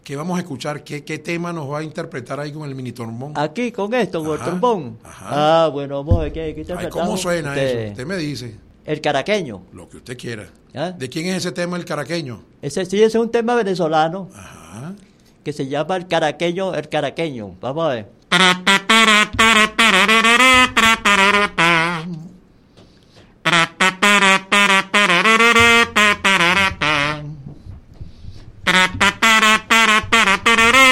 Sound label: merengue